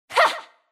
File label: basic attack